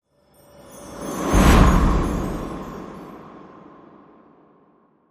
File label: Holiday Mildly Intense Whoosh